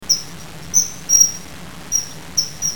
kawasemi

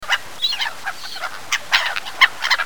yurikamome

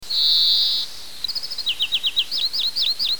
kawarahiba

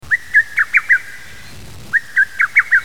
hototogisu

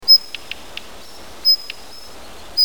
joubidaki

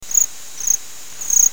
hirenjaku

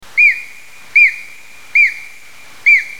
aogera

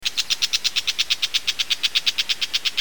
akamozu

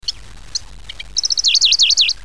simasennyu

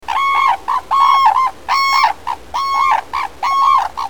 tanchou

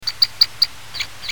akakoko

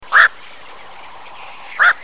goisagi

